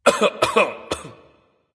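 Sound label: nonverbal clear throat; smite; voicepack; ullr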